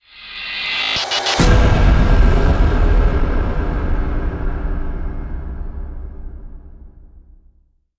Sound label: Round Switch